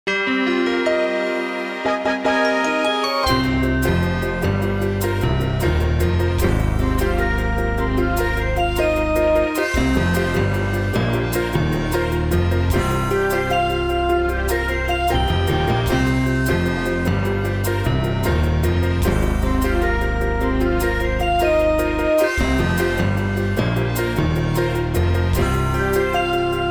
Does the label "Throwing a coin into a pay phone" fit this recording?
No